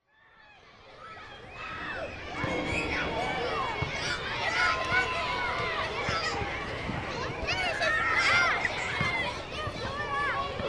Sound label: SCHOOL ELEMENTARY AMBIENCE